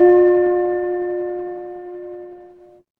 instrument singing bowl

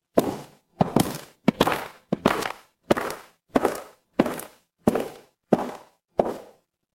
footsteps gravel

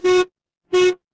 mechanical horn

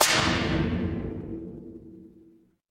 magic scifi laser

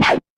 retro game laser

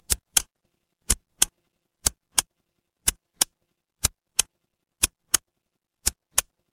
mechanical switch

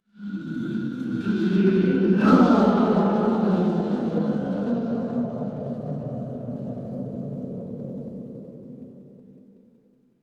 crowd gasp

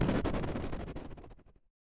retro game explosion

